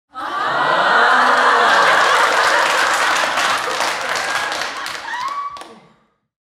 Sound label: Sitcom Audience Big Crowd Laughter and Applause; EVG Sound FX